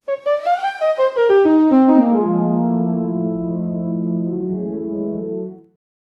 jazz transition

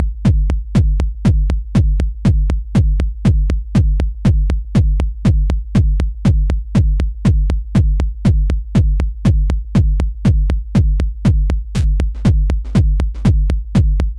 a building technohouse

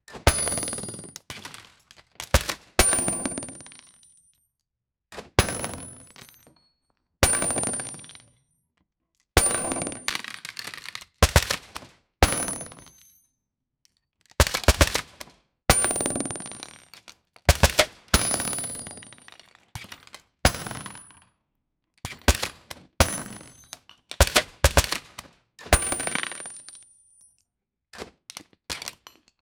machine gun realistic fire, warfare

